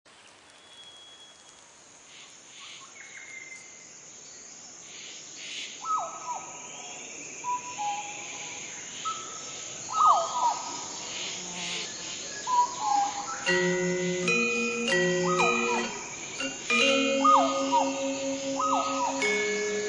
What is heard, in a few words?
Gonggongan, nature bali spa